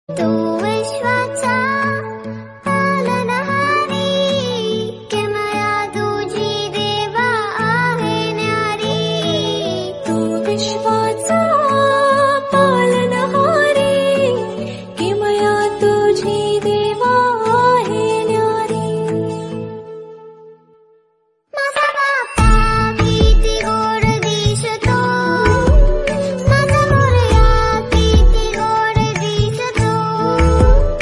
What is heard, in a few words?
Bhajan Ringtone